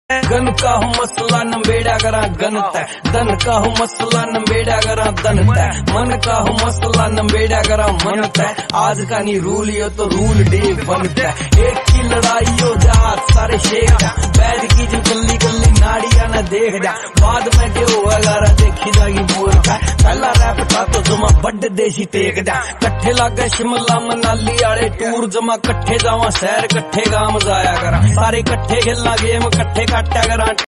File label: haryanvi ringtone